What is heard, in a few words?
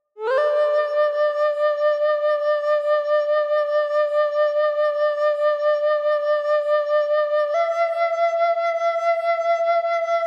neva synth